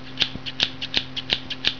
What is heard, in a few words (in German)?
SCHERE